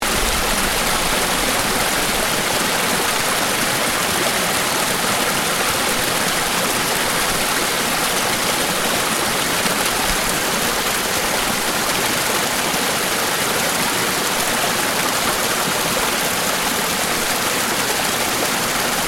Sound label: Waterfall loop, Waterfall, Medium Size, Continuous Running Water; Nature